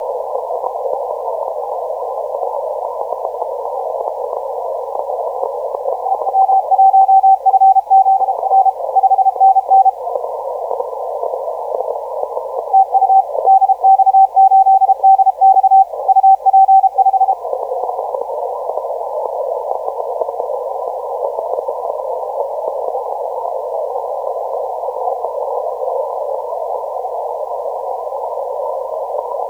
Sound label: 20m